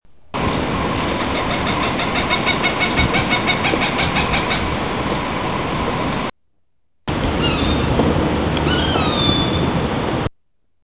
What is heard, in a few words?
coopers hawk; Birds; Hawks